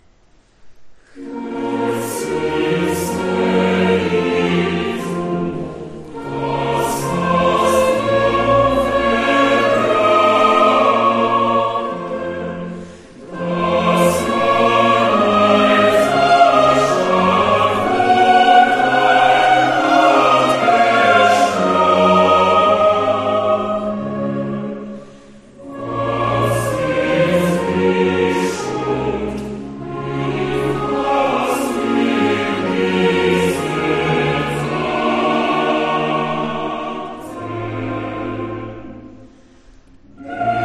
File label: Choral; Johann Sebastian Bach; Pasja wg sw. Mateusza BWV 244